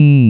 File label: stim F1 200; Kõnetaju; Peatükk 18 Kõnetaju